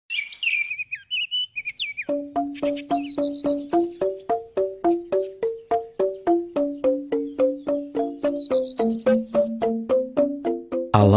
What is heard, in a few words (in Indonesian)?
Rrindik Alat Musik Tradisional Khas Bali